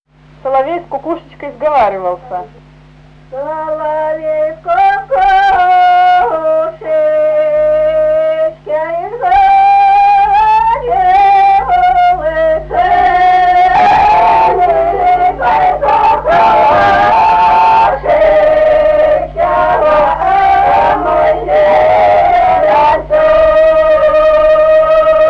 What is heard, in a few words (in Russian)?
лирическая; Соловей с кукушечкой сговаривался